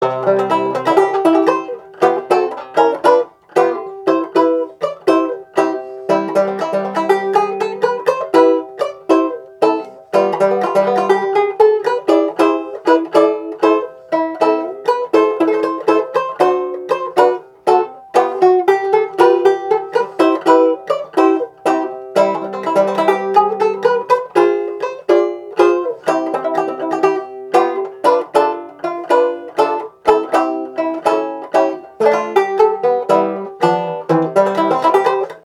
March; Ridgeway March

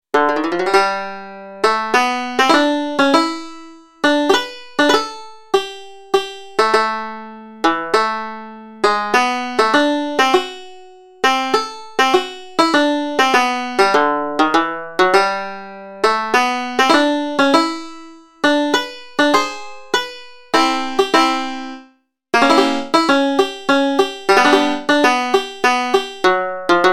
Mazurka; The Violet Mazurka

